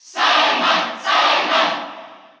Cheer Russian; SSBU

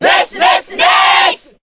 Cheer Korean; Ness; SSBB

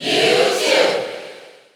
Cheer English; Mewtwo; SSB4; SSBU

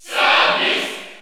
Cheer French; Samus; SSBU

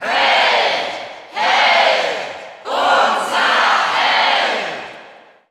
Cheer German; Hero; SSBU

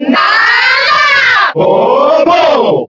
Cheer Italian; Ice Climbers; SSBB

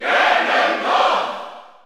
Cheer German; Ganondorf; SSBU

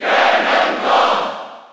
Cheer German; Ganondorf; SSB4

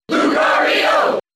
Cheer English; Lucario; SSBB

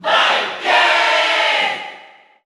Cheer Italian; Ken; SSBU

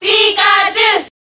Cheer Japanese; Pikachu; SSBB

